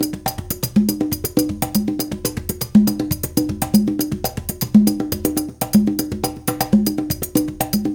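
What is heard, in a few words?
120 bpm, Classic Conga; Spectrasonics - Supreme Beats - World Dance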